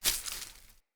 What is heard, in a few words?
Grunt Foley Land; Enemy; Characters; Corrupted Sproutfolk